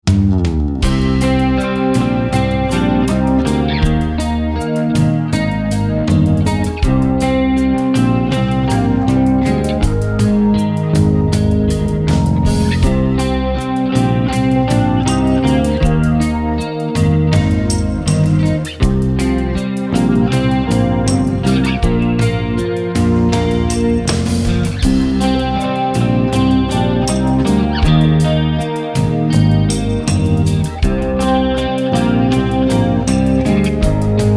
Wave Ballad, urban

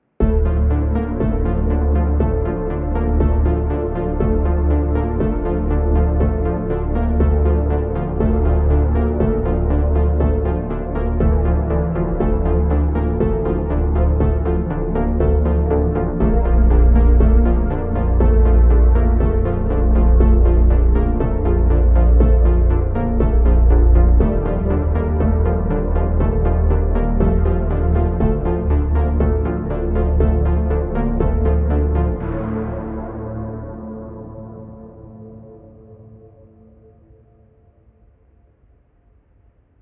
Neondreams Reduced Sequenz Fett

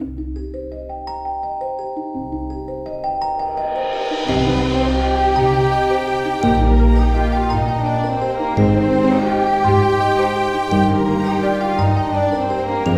Warm Tones Full

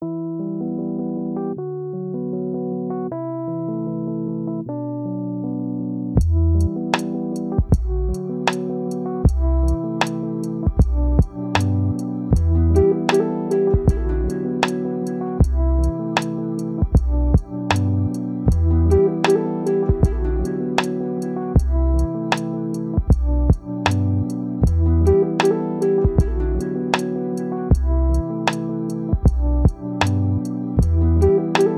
Mellow Pastime, mellow beats

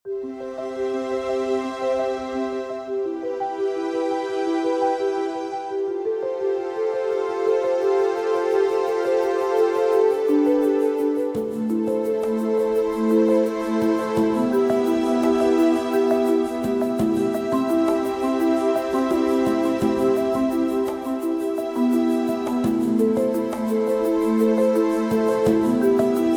Softer

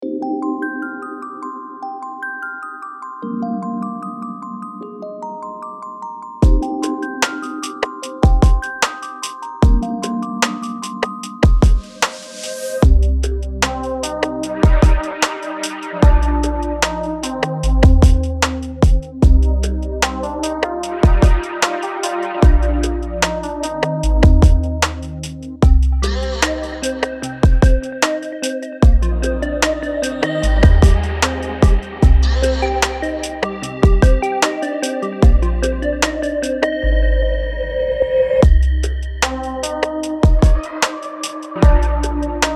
Sad story, mellow beats

